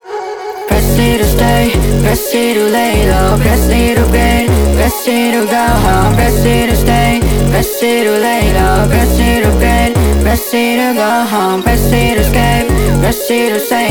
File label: drop pitched up